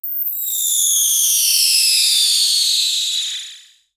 falling stars sound effect

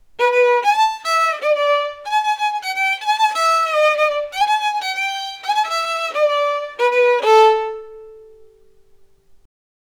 cartoon musical violin

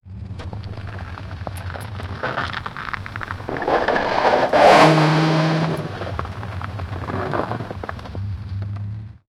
exterior recording of a, offroad

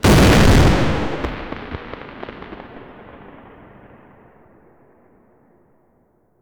confetti explosion

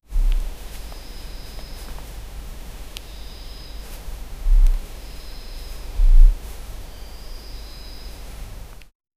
man is breathing heavily

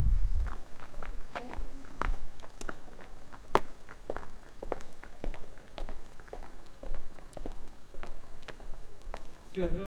man walking in sillent hi; hill

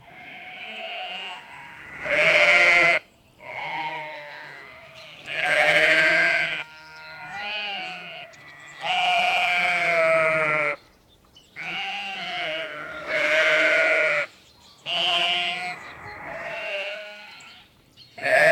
sheeps choked

